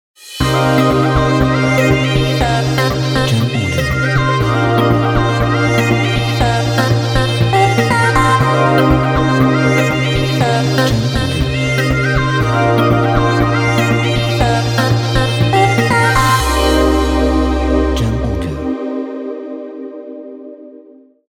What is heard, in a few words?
Vlog Broadcast Intro